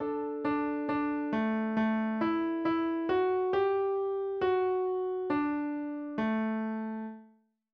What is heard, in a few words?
instrumental